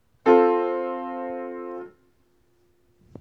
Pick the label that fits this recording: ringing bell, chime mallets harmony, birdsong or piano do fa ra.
piano do fa ra